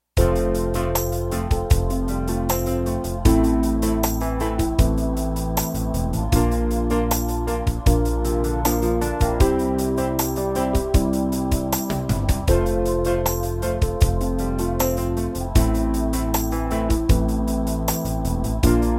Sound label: 16beat ballad